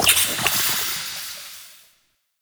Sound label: Gas Burst; Ambience; Bromius Inside